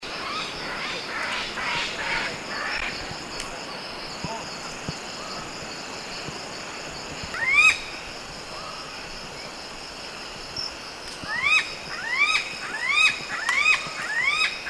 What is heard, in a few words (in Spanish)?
Loro Negro